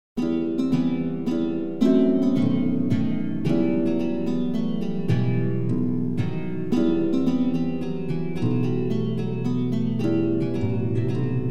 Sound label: mazurka, torban; MAZURKA (g)